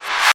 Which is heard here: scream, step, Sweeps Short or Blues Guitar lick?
Sweeps Short